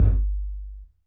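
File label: FX kick 808, right; Masterbits - Soniq Elements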